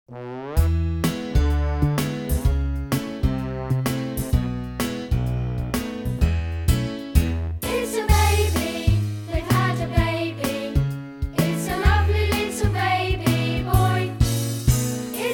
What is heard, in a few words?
choir